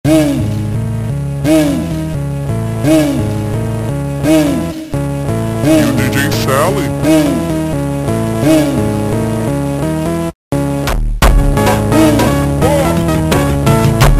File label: Creepy Funk ultra Slowed